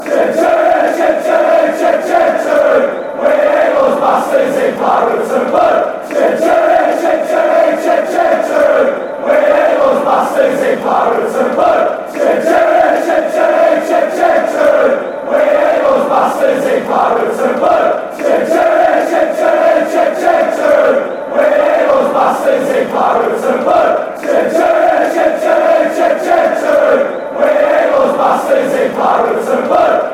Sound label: fan chants